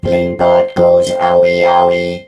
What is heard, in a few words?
hurt